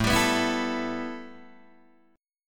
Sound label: A 7th Suspended 2nd A Guitar Open D, chord